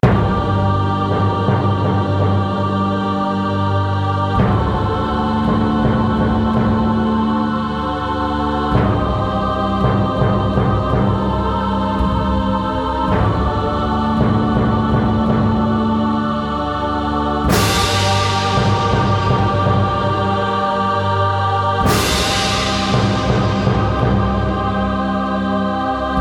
game over; Empire of Angels IV

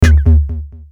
Character Hit

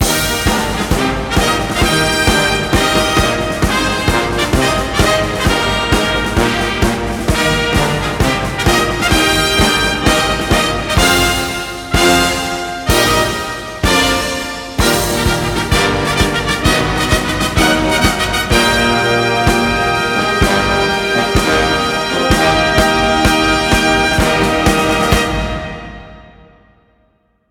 Broadcast Fight Song

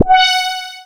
Text Feedback

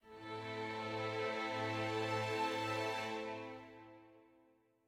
sad med end

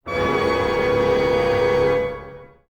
Hit Sting; PS2; PSP

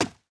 Player Footstep; gamerip; escape from russia mobilization

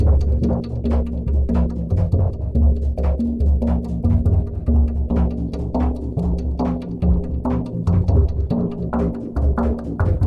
Battle Loop; Infinity Blade 3

